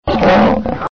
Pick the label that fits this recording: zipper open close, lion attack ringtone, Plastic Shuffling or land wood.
lion attack ringtone